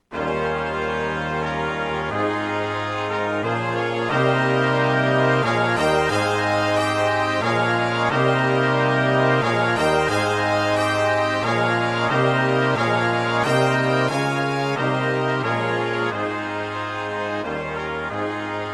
Christmas Brass

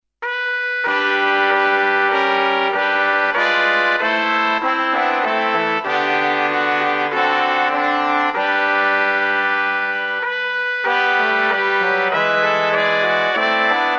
Christmas brass medley

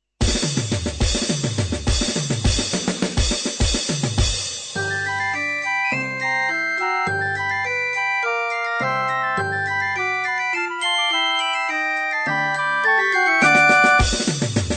midi

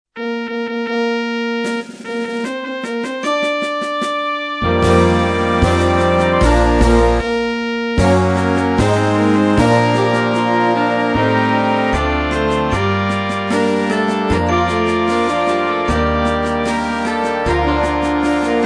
fanfare

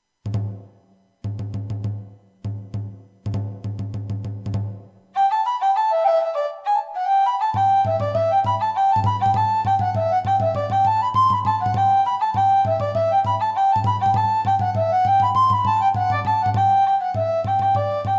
Irish jig

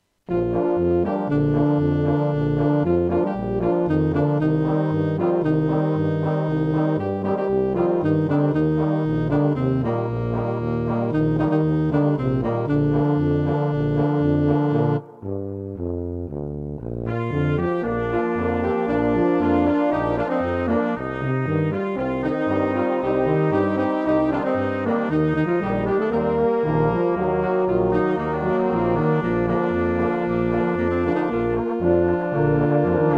polka; blaaskapellen

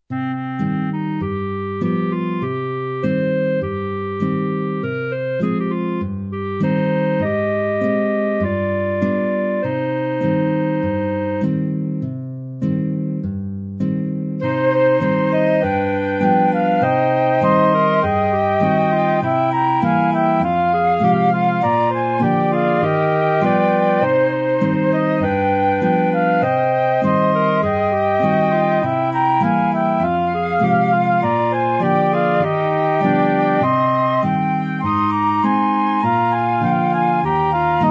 duet; a Glezele Yash

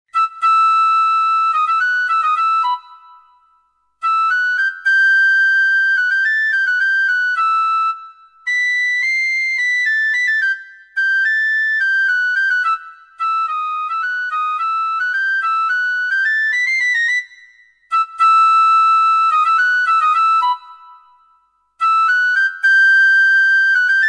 Sax; Cantabria; voordrachtstukken

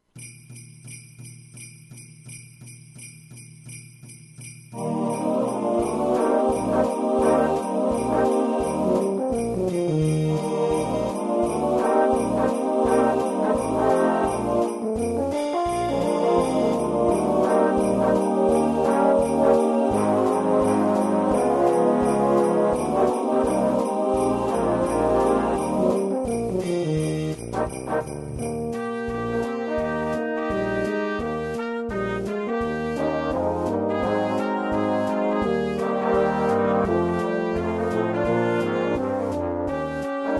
Jingle Bells

